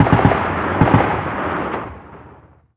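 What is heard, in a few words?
gun 50cal distant; ModernWarfare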